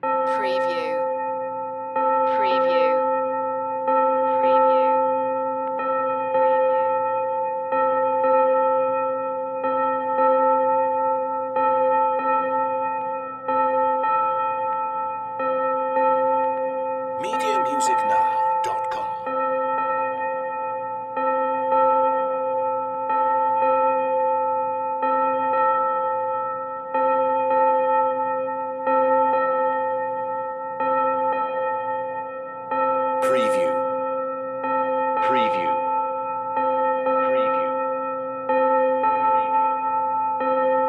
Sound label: Church Bell Chiming